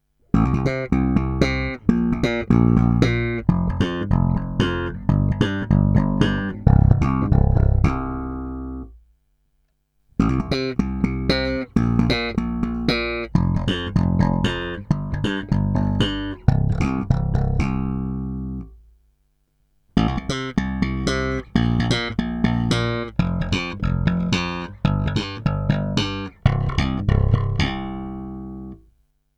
Slap na oba snímače aktivně, Baskytara; Aleš Vychodil AVBS5

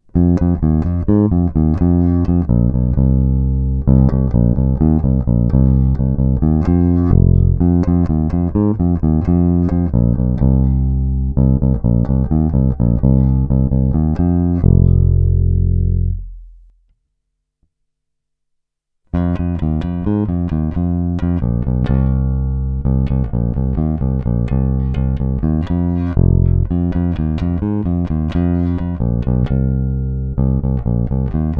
Fender Blacktop Precision Bass, Bonusová ukázka ve stejném pořadí jako výše

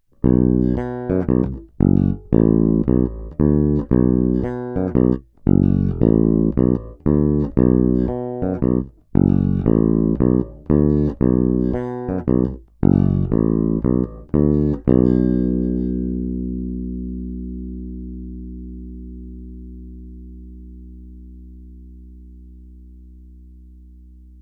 Greco TB-750 Thunderbird Bass, Snímač u kobylky

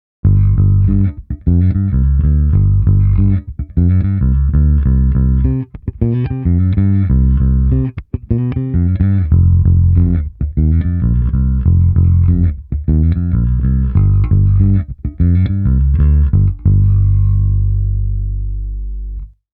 Paralelní zapojení cívek 2 a 3; takový trochu jiný Precision; Ernie Ball Music Man BIG-AL

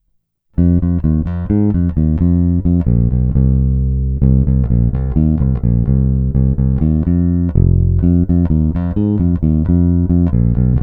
Ukázka 1 (RW), Mike Lull V4, baskytara

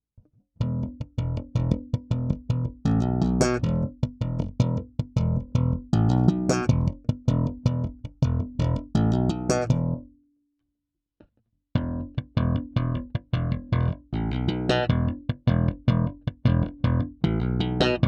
Ukazka 2 - Slap, Music Man Sterling baskytara; Ernie Ball

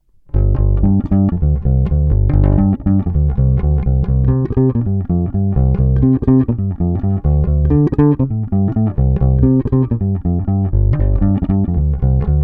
Precision Bass, Bonusová ukázka; Fender Custom Shop Pino Palladino Signature Precision Bass

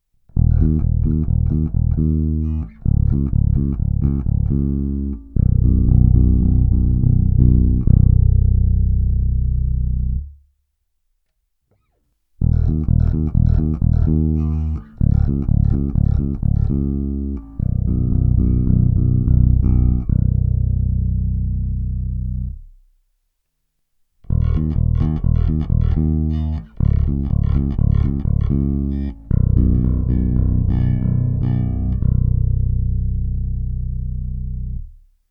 Slap na oba snímače pasivně, Baskytara; Aleš Vychodil AVBS5

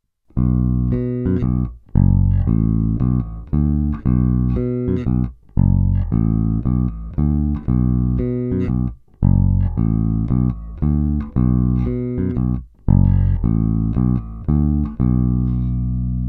Fender Blacktop Precision Bass, Oba snímače SC

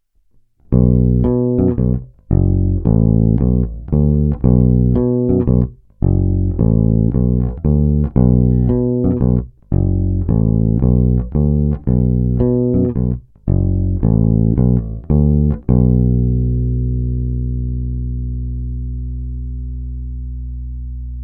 Precision Bass, Hra mezi snímačem a kobylkou; Fender Custom Shop Pino Palladino Signature Precision Bass

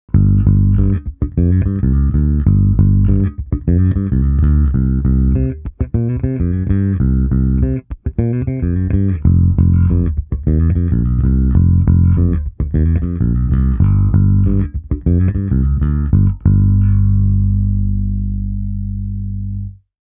Sériové zapojení cívek 1 a 2 – taky zajímavý zvuk, hutný, průrazný; Ernie Ball Music Man BIG-AL

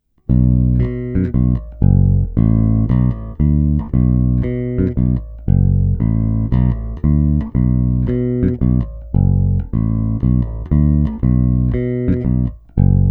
Hráno mezi snímači, Tónová clona naplno, baskytara; "Povinné" nahrávky

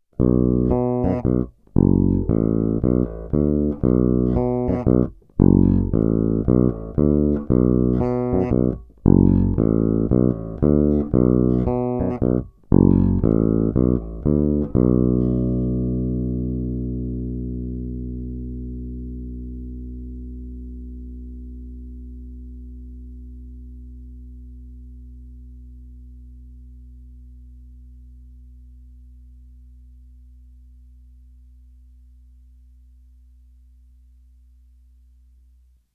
Fender Blacktop Precision Bass, Snímač u kobylky HB

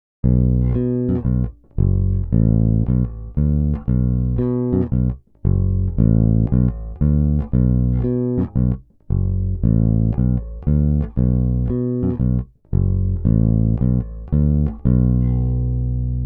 Hra nad snímačem; Fender Custom Shop Pino Palladino Signature Precision Bass

